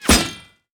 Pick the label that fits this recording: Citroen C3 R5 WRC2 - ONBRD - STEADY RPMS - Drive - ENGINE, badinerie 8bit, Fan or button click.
Fan